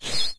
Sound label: science restore down, windows sound schemes; windows 9x